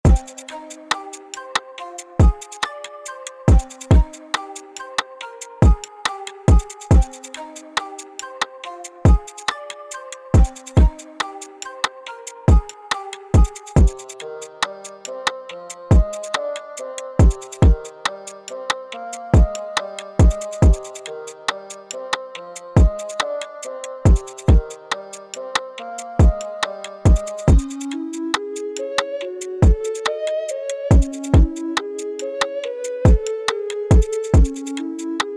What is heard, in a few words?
tetrad winds